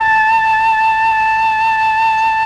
flute A3, MELLOTRON; Retro Sampler; Choir + Flute

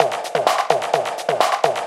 FX Loop 128 BPM, House; Best Service; House Definition VOL-1

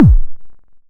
Sucker Punch Kick; Cwejman S1; DevSnd Cwejman S1 - JK

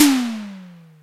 TOM, Simmons SD-8 Drums

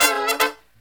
Brass Riffs; USB Soundscan vol.29; AKAI

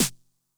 Snare Drum, Low Tune Low Tone, 909; classic machines; 909mod

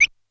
D6 Bird Tweet, SFX Kit; Yamaha PSR-E323; drum machine

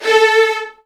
A2, Virtuoso Strings; ILIO; Kirk Hunter Virtuoso Strings VOL-1

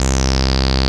BASS, Analogon; A-BASS 2

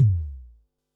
Bassdrum, Pearl SC-40

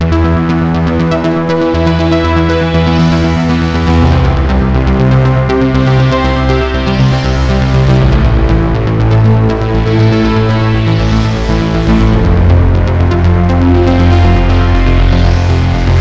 Arp, Keyboards

